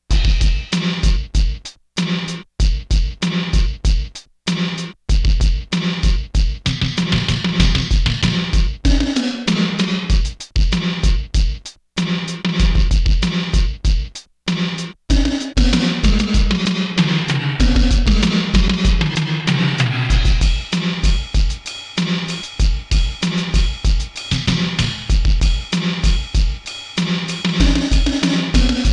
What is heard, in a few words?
AMBIENT DRUMS